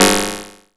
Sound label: BRIGHT, PERC HITS; Zero-G Ambient 1